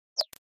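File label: Flash Gear Electronic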